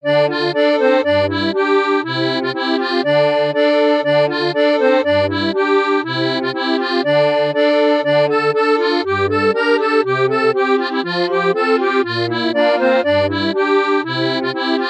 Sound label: Gavotte from Celtic Brittany